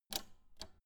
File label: old button half pressed